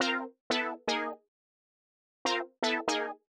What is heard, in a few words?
120 Stabs C, Keys, Nu Jazz House; PowerFX